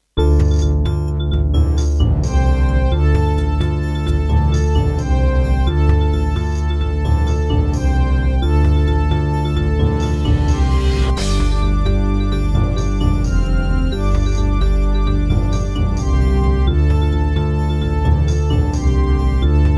Serdaco McFly, wavetable; Heretic

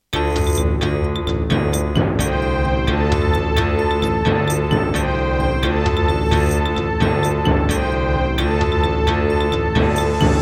Roland SCB-7, wavetable; Heretic

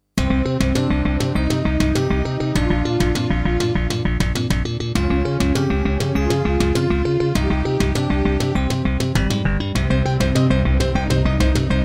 Introduction, Yamaha DB51XG GS MODE; Hocus Pocus

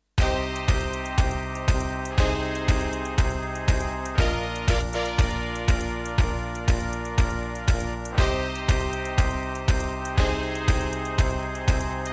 Terratec MiniWaveSystem SOWT-11; Cybernoid II

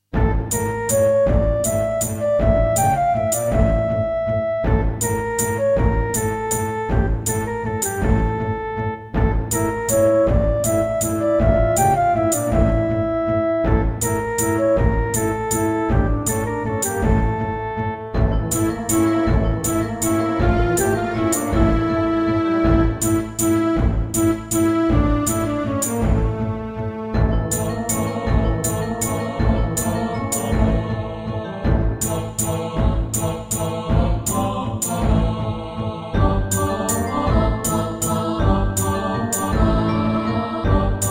NEC XR385 (DB60XG Clone) GS MODE

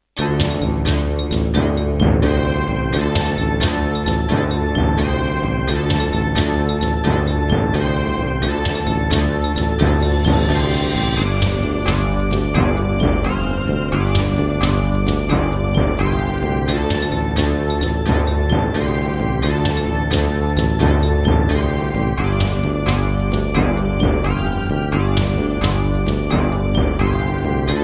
Gallant GMW-1000, wavetable; Heretic

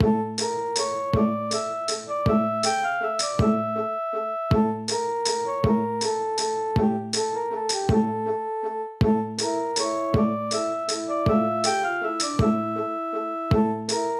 Alesis Harmony 32

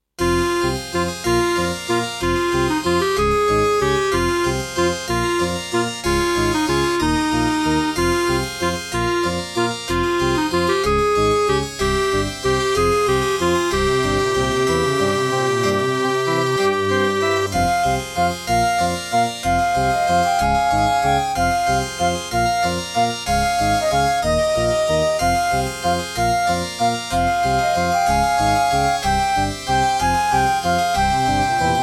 Gravis UltraSound 1MB Rev.3.4; Death Gate

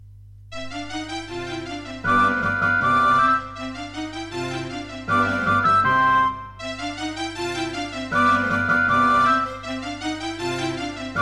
Yamaha DB50XG; classical; GS MODE; Mozart; Concerto K467 partial

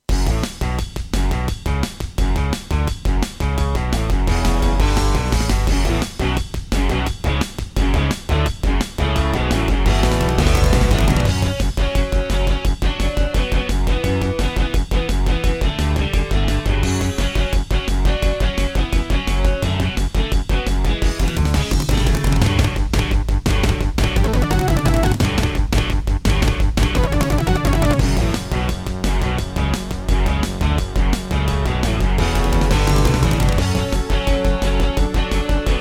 Intro, Roland SonicCell GM2 TEMPLATE; Duke Nukem 3D

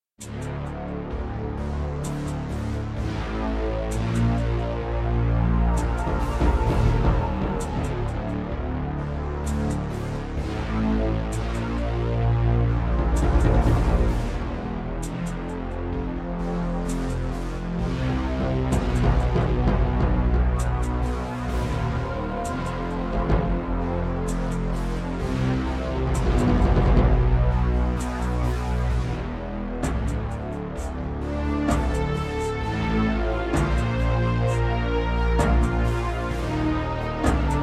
Roland Edirol SD-20 GM Contemporary; Descent II

